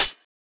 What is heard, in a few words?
Closed HH